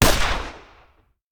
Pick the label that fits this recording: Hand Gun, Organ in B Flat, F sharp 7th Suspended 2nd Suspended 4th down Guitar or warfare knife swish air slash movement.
Hand Gun